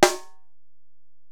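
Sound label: Acoustic Snare, Alesis DM-PRO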